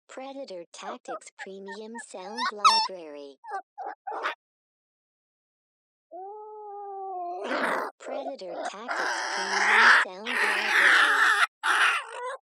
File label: Baby Badger Rage